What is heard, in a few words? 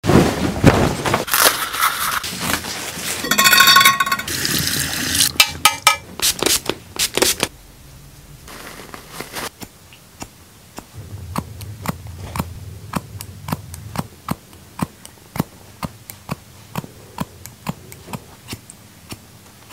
hair cut asmr; اسمر کوتاه کردن مو